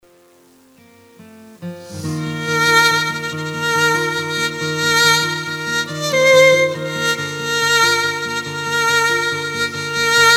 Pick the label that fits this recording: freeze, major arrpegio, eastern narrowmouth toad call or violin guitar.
violin guitar